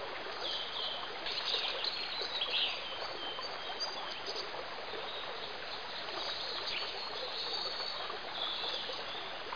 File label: RIVER